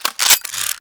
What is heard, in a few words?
Dart Gun Reload; Quill